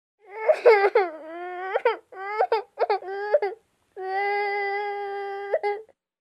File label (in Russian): gorkij plach